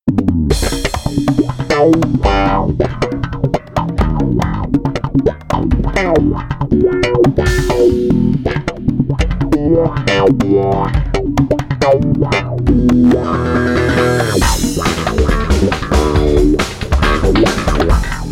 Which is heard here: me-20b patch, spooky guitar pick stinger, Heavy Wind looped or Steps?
me-20b patch